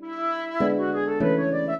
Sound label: minuet, flute harp; Mozart